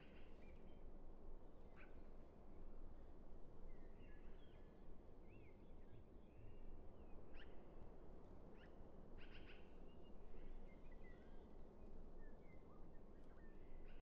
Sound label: amb map settlement empty